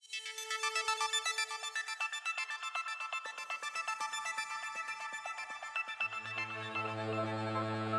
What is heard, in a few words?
musique tutorial jingle victory; gladiabots